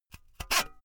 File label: saw use short fast unsteady